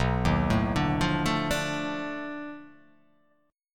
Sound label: B 7th Sharp 9th Flat 5th B down